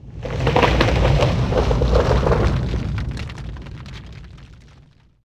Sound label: tree uproot; Gamestar